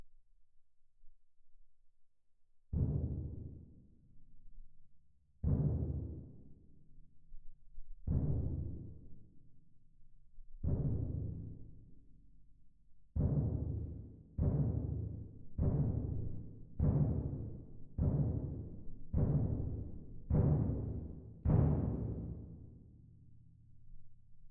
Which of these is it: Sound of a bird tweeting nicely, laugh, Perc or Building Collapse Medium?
Perc